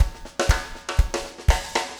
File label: 4 on floor 120, loops, 120bpm